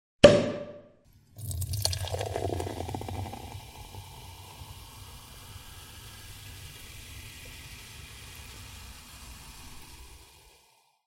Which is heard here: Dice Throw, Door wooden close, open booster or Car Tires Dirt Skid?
open booster